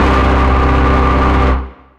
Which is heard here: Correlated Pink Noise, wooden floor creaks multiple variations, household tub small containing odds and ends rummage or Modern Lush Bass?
Modern Lush Bass